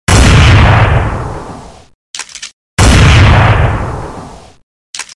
bullet notification ringtone; android

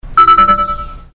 motorola razr d1 d3 ringtone

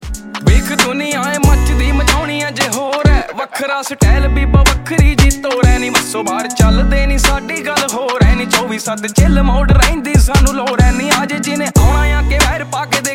elevated ringtone

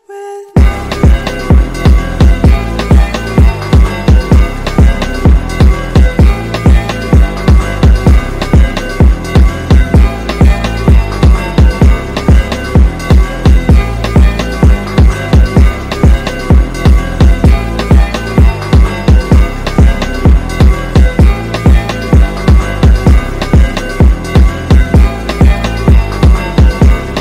ring ringtone

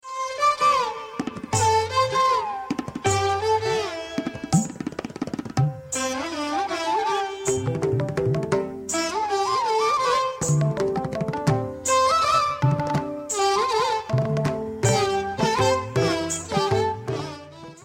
classical ringtone

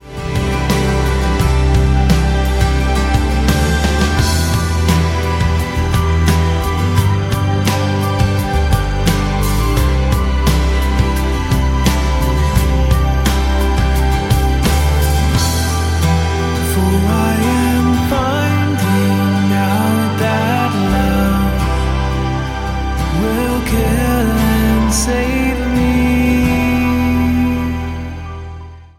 instrumental love ringtone; guitar

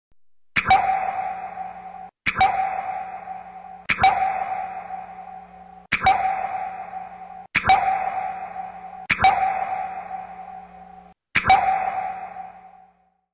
water drop ringtone; android

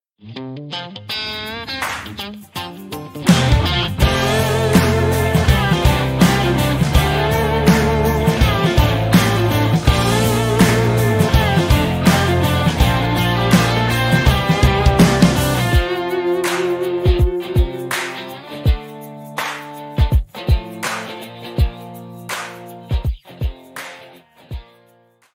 one big country instrumental ringtone

